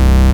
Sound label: Offbeat Bass C; Vengeance Samples